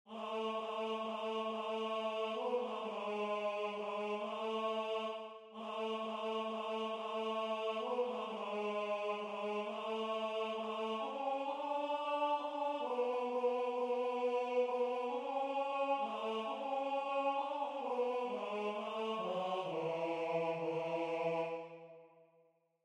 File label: Tenor